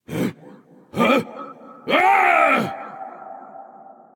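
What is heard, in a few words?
non verbal fear, voice; cernunnos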